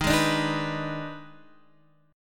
D sharp Major 7th Suspended 4th Sharp 5th, Guitar, Open D; chordshape